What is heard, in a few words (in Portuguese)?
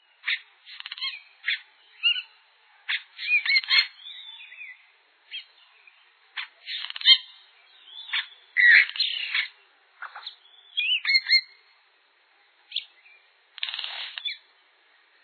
japiim